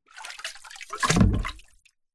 foley metal pole section drop sink 2ft water plastic tub thud